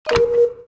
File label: button